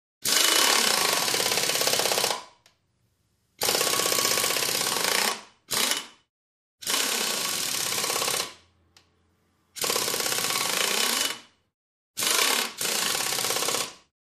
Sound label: Air Tool Wrench Whir